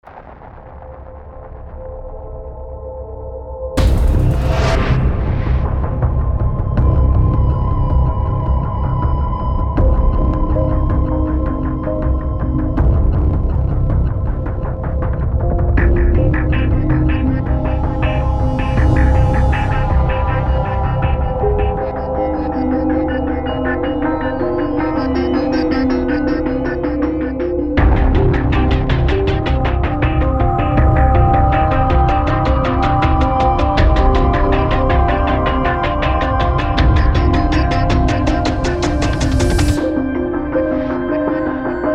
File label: action thriller tension-and-chase